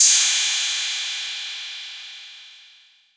PERC 808; Wizoo - Platinum 24 Electronic; CHR PERC 808

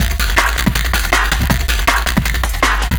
Ghost Beat, Drum N Bass; Sonic Foundry (Sony Creative Software); Knutrix Drum & Bass

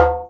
JEMB, DJEMBE; Univers Sons; Basicussions

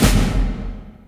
DEATH, AMBIENT DRMS; Spectrasonic Distorted Reality 1

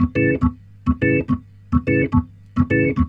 Roots Reggae, B-3; Sounds Good

